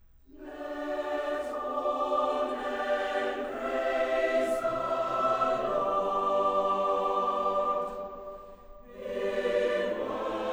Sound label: Symphony No. 2 in B-Flat Major Andante con moto; CBSO; Edward Gardner; Mendelssohn; Op. 52, MWV A 18 Lobgesang